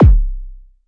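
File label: mbrute kick, Arturia MiniBrute; DRUM MACHINES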